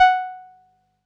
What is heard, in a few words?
505, String Machines